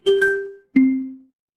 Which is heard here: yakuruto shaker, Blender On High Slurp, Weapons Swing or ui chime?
ui chime